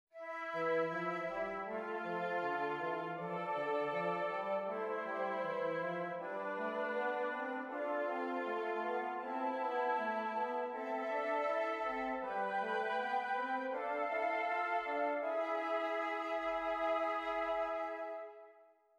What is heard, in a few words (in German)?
Stimmiger Contrapunkt; Ronge